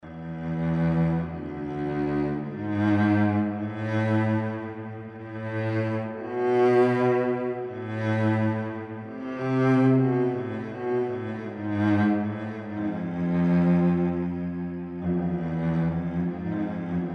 Fishers' Lands, Genre: Classical Instruments: Cello, Chime, Choir, Gong, Strings, Double Bass, Percussion